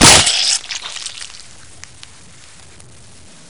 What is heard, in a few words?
baby attack; cof